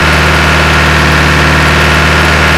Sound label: i4 diesel medium, engines; acf engines